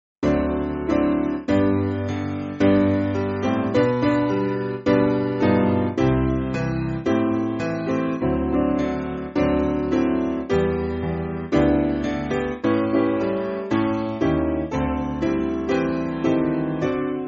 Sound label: Spiritual, Piano; I've Got Peace